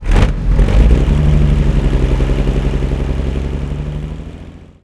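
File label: cromwell engine interior load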